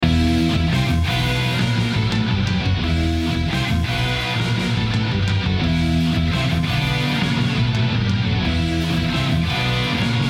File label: Stutter Edit Mix Bus